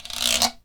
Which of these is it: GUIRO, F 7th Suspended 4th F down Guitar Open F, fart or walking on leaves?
GUIRO